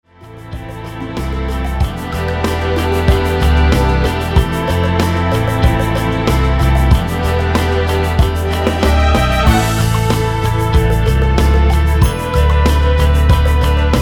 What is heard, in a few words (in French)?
instrumental karaoké